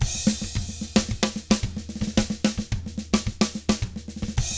SMBA, SAMBA; ILIO; Double Platinum Drums 1

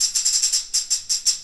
Tambourine; Sonic Foundry (Sony Creative Software) - Essential Percussion

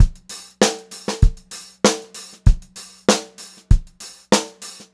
FUNKY BEAT, Funk-Groove Drumloops; USB Soundscan vol.38; AKAI

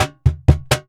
Flamenco, Percussion, Perc FX, 126

